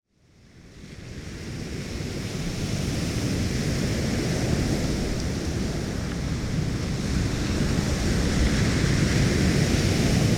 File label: North Sea Waves Overstrand Beach Norfolk UK; Mat Eric Hart